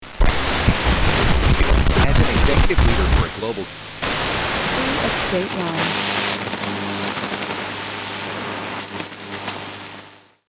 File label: Old radio tuning crackly frequency sweep